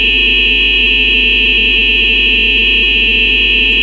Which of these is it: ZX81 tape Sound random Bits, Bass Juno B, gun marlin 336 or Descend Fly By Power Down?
ZX81 tape Sound random Bits